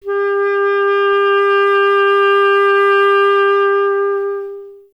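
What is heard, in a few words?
wind clarinet G; Miroslav Vitous; Solo Instruments VOL-1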